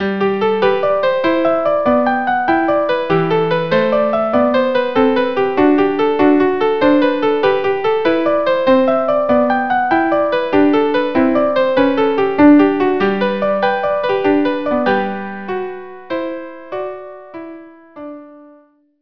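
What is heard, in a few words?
violin viola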